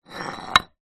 rock or stone movement drag then drop concrete ground; foley